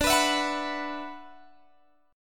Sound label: D 6th Add 9th, Guitar, Open G